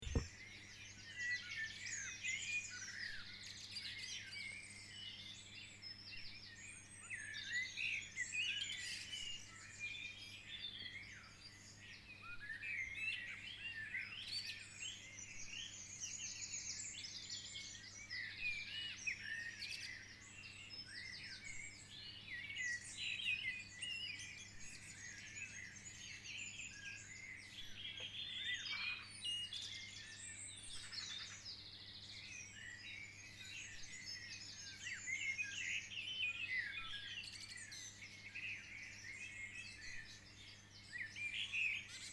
Kilfinane Dawn Chorus; ireland

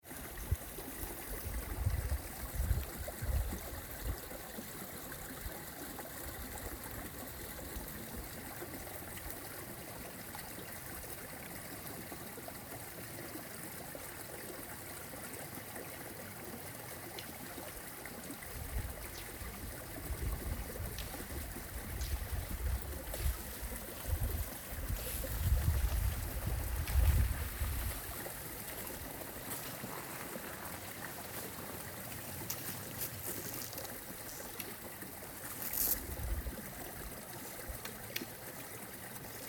Gurgling stream; Gossip's Corner Gatineau Park Quebec; canada

